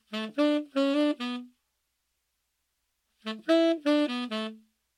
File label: tempo saxophone